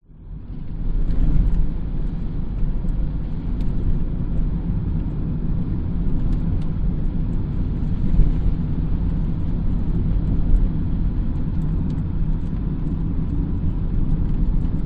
vehicles car driving winding road slightly wet light bumps internal windows close; windows closed; binaural